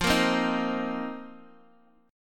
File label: F 7th Suspended 4th F down Guitar Open G